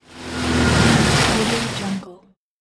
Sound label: motor boat pass by